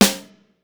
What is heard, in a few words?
plate snr; sr16 sd